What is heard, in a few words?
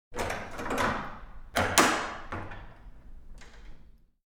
Wooden Window Shutters; Inquisitor 3